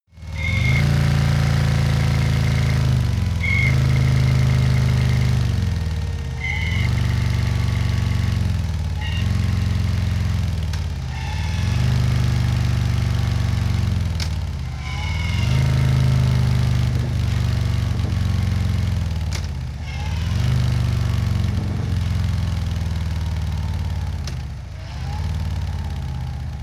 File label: motorcycle harley decelerate screech; motorraeder; verkehr transport